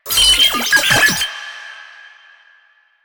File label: Sniper Drone Vocal